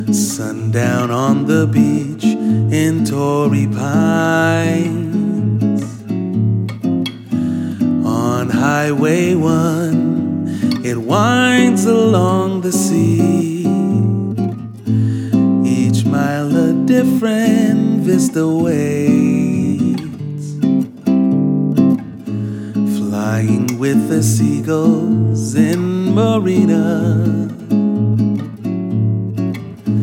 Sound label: Bossa